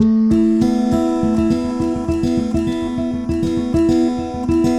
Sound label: Guitar And Synth